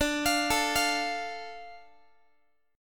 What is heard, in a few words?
D Minor D Guitar; Dm arpeggiated; JGuitar